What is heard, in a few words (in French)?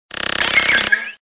Cri